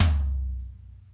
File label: TOM, real live kit; Another Real Life Kit